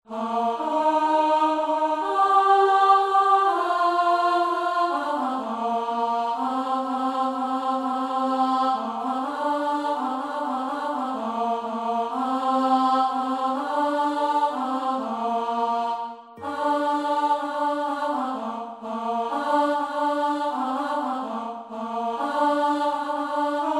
Chorus Male

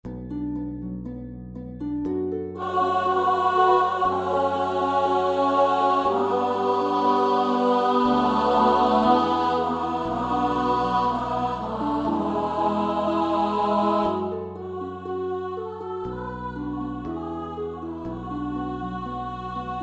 Altos

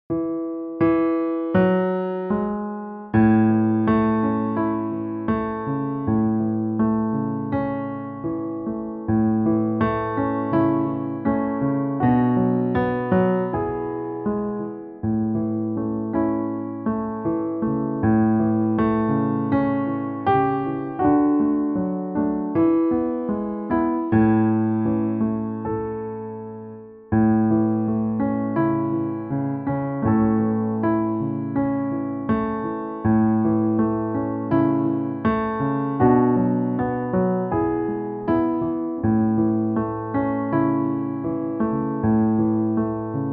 Piano; The Bonnie Lass O Bon-Accord

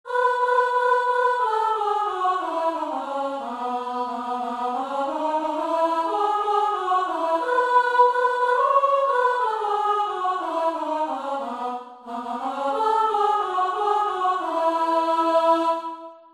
Tutti Verse

